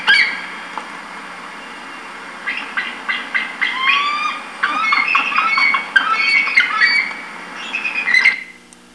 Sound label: eagle